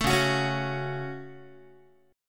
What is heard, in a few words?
Db Suspended 4th Sharp 5th Guitar 4A,4E,5A,5D,5G sharp,6C sharp; strummed; JGuitar